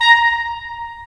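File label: wind clarinet; Roland - LA Composer vol. 3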